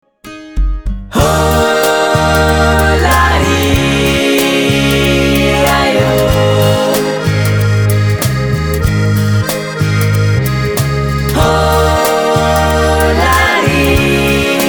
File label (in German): jodler; an jodler fuer di